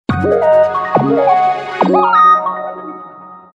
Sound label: Ring Tune; Motorola; RAZR V3xx H3G